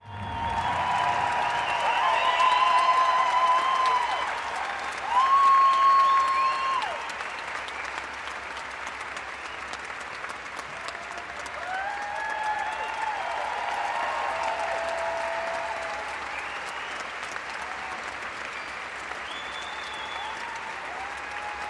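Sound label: interior british rock show applause guitar clapping uk seaside town; theme park; Sonniss GDC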